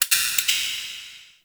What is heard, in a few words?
Sparks; Warframe; The New War; Zariman